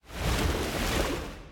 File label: lobby water; smite; cthulhu